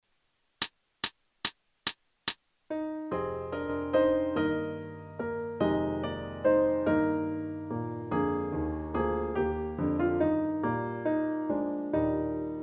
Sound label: accompaniment; Carol of the Birds